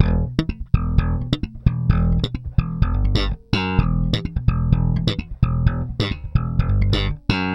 Slap, Bass; Bass X

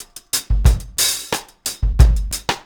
RG90 DRUM, REGGAE

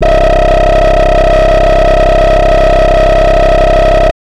Anasound Long C, Industrial Analog Single Notes

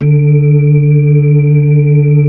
B-3, Brite; Keyboards of The 60's and 70's

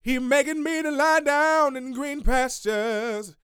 Vocal Gospel; Vocal Planet Vol1 Gospel

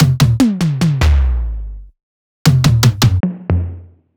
One Shots Toms

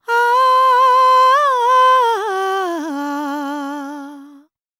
World Voices, INDIA; ILIO; Vocal Planet

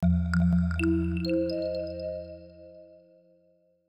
Synth Block Loop (120BPM); cinematic drama; Loops; musicradar

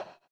SONAR; Spectrasonics; Metamorphosis

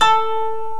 DULCIMR KOTO; OMI - Universe of Sounds

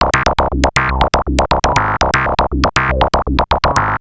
Analoop, Industrial Analog

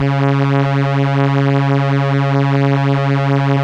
STR ELKA Cellos, Elka Strings; Roland LCDP09 Keys of the 60s and 70s 1

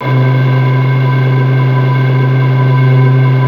flute C, LIQUID FLUTE; Best Service ProSamples vol.55 - Retro Sampler; AKAI

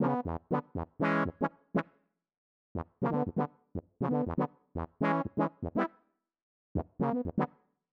120 riff 4 bars E minor, Keys, Nu Jazz House; PowerFX

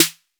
808 SD; 90 sSampleCDs; Crypton - DrumBase5000; DR55+110+220

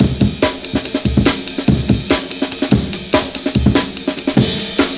Amen Origional; Urban Shakedown

